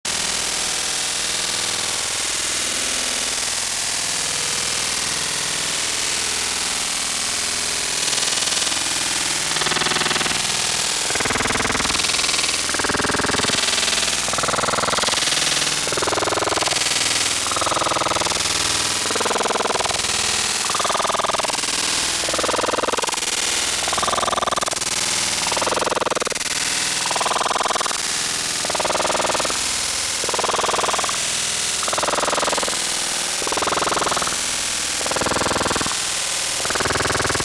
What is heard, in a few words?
Noise Electronic Music